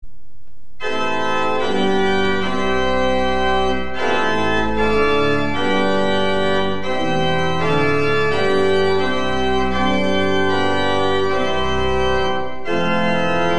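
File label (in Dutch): orgel, Prestant 8', Octaaf 4', Quint 3', Octaaf 2', Manuaal (Pedaal: Prestant 16', Subbas 16', Koppel Hoofdwerk-Pedaal)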